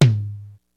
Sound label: DPM3 kit, PEAVEY DPM; Rubber Chicken Software Sample Bank